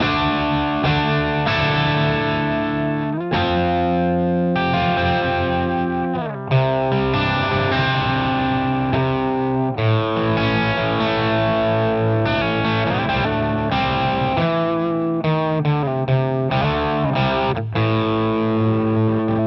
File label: Pro Mod Crunch Bridge; Charvel Joe Duplantier